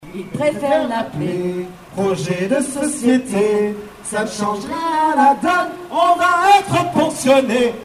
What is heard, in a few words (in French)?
ambiance musique